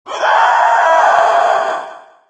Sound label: screech; chungo